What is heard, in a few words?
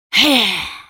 spell cast effort, en US; Elise